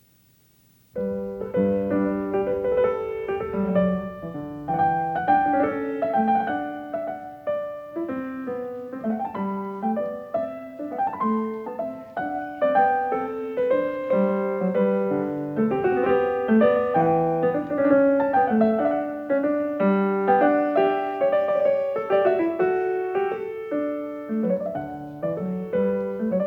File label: Variation 5 a 1 ovvero 2 Clav., PIANISTS; BACH; GOLDBERG VARIATIONS